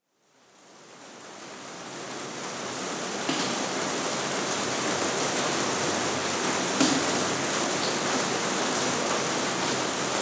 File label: massive summer shower recorded indoors below a plastic roof